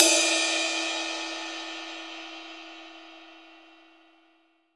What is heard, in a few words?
ride cymbal, mono; AKAI S6000 CD-ROM - Volume 3; MONO RIDE CYMBAL 1